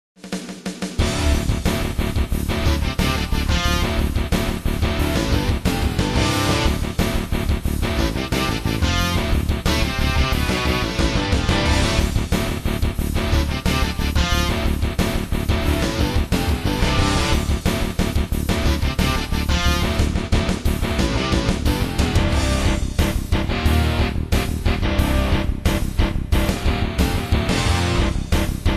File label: Race BGM; Motocross Trial Extreme; android